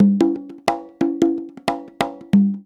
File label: 90 PERC; Best Service Advanced Media Trax Modern Composer